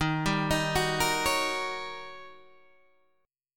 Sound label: D sharp 7th Sharp 9th Guitar Open G